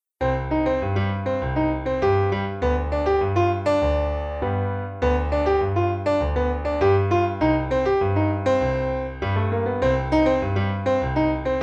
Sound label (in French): Piano seul